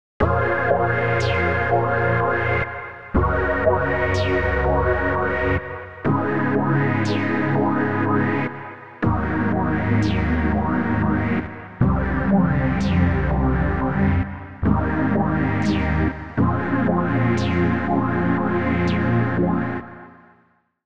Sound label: human life seq